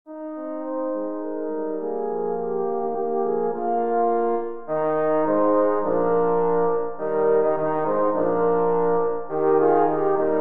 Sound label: horn quartet